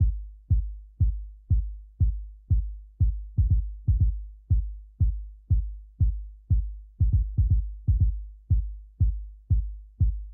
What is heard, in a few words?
KICK